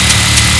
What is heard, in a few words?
giant heavy empty; tf2classic